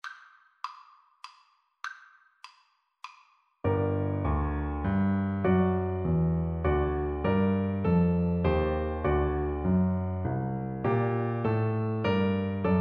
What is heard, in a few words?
air, Tuba